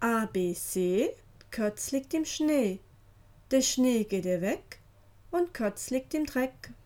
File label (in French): comptines; katz